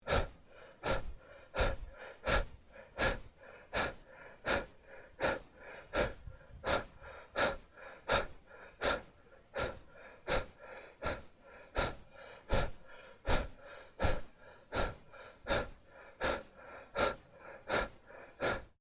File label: breath run fast